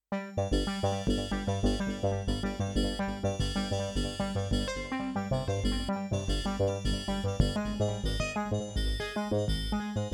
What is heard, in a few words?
Arhythmic 3 timbres bip